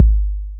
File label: TR-808 Kick, Roland TR-808